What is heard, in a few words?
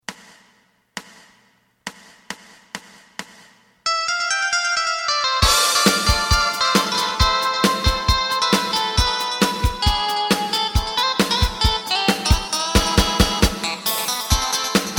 bass